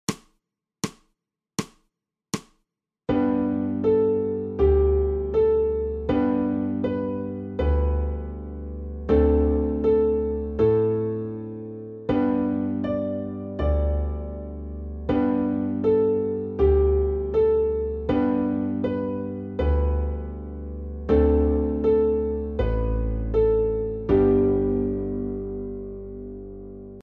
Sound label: SOLO SOPRANO